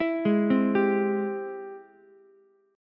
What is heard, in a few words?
G sharp Augmented Major 7th, Ukulele; arpeggiated; 9,8,8,10; JGuitar